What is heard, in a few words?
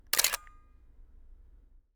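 interior nikon f4 shutter cap off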